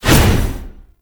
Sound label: Hammer Whoosh; Warframe; Veilbreaker; Sprag